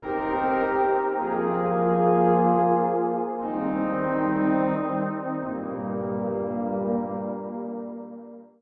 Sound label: sleeping with elephants pads; LOOPING AMBIENCE